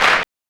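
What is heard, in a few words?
HSE-CP, house clap; X-Static Goldmine 1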